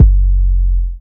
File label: huge kick drum f sharp key